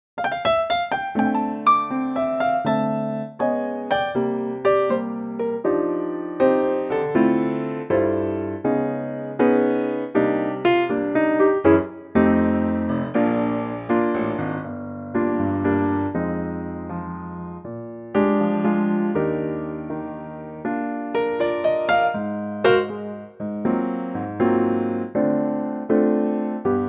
Bb piano; key - Bb - vocal range - A to Bb; Classy piano only arrangement of this great standard

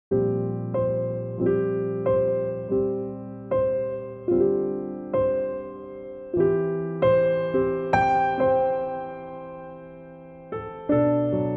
G piano

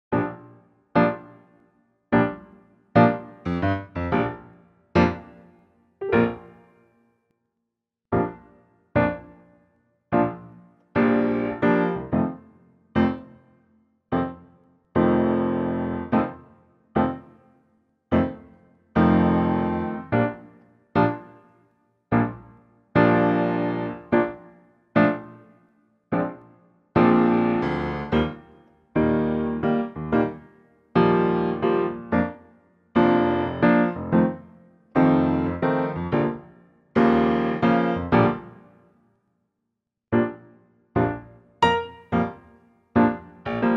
Bb piano; key - Bb to B to C - vocal range - F to G; Superb piano only arrangement, with easy and light comping just keeping the feel and groove

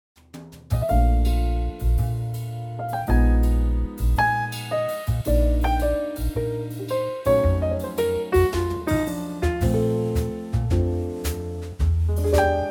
Trio; key - Bb - vocal range - A to Bb; 2019 Trio arrangement of this wonderful classic standard, lightly swinging in tempo

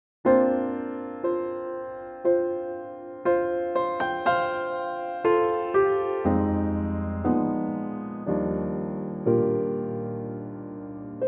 Bb piano; Pianotrak; key - Bb - vocal range - F to D; The best thing to come out of the movie Bugsy Malone was this song.

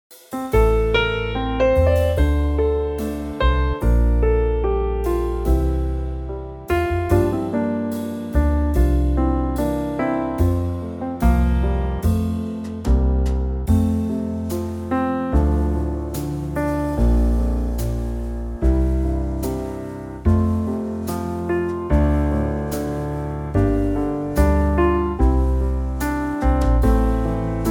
F Trio

